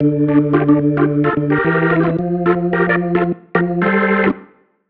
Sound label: Keys Line 110 D, 110bpm, hiphop; musicradar